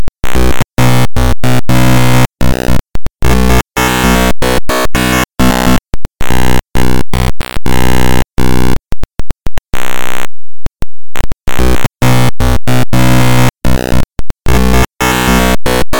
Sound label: buzz tone